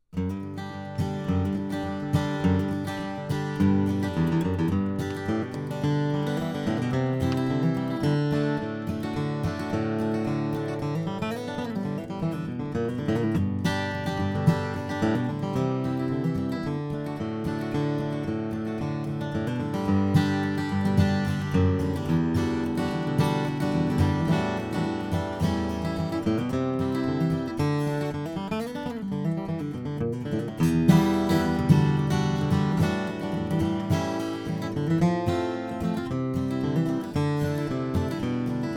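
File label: pinkham 1a, steel string guitars flattop; ron pinkham woodsound studios; dreadnought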